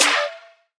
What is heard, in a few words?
trap snare D key, steel snare drum sample tuned to the D note. Loudest frequency: 4390Hz; snare drum samples